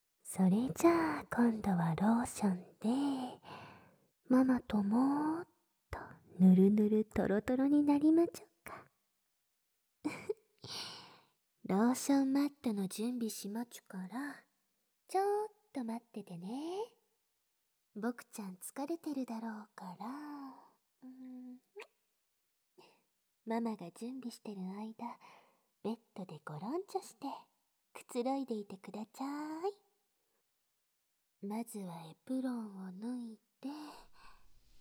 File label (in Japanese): ローションマットで全身リップ&アナル舐め手コキ; ゆかりママ2; BGM無し